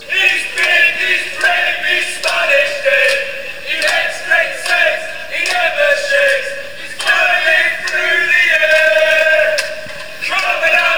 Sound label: chant fanchants; david de gea